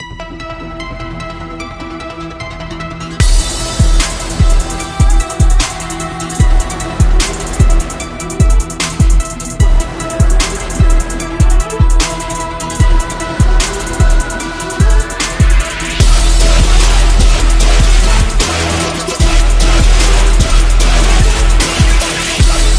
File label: Trap